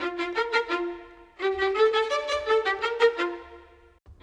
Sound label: Violin; Sonic Foundry (Sony Creative Software); Orchestral VOL-2; Modern 09